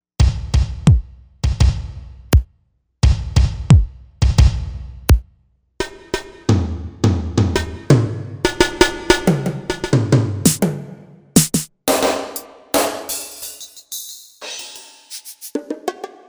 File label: Drums, Korg M1Rex; Factory Patches